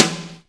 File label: SN BRUSH, Drums & Perc; AKAI S6000 CD-ROM - Ultimate